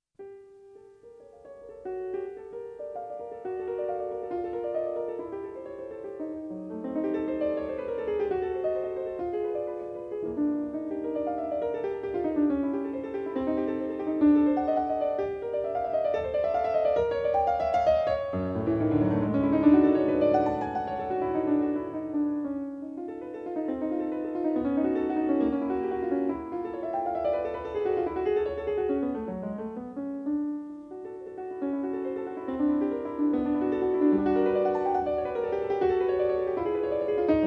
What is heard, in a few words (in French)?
Arabesques pour piano; RTBF